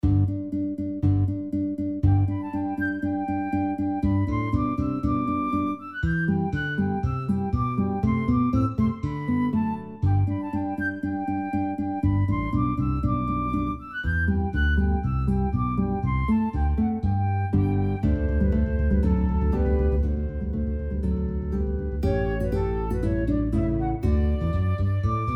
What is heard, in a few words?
Tambourin, Orchestras